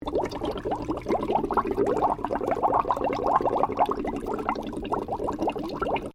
Water Bubbling Loop, Heated water sounds; Nature; Foundation4

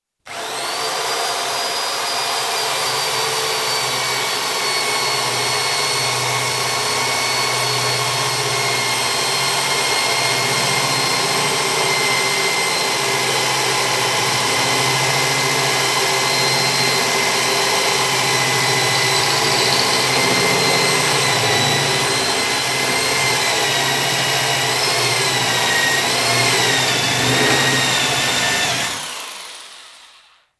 Electric drill, single hole in thick metal bar; Drill Sound Effects; BBC

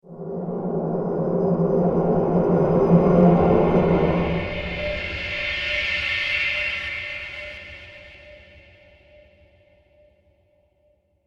Orbit Reverb Ambience, science fiction; Andrew Fleming

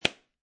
Foley, Aerosol Can Cap Close; Lid; Objects

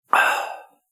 Ahh Refreshing Drink Sound; Drinking Sound Effects; Human Sound Effect; Andrew Fleming

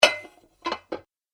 Heavy Pasta Bowls Clangs And Slides; Dishware Sound Effects; Household; Andrew Fleming

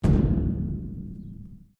Strike Metal Hollow Tank Soft; Materials

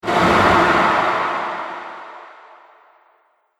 Epic Sf Explode Impact; Sci Fi Sound Effects

